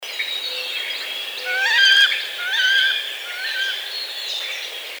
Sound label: Wood Duck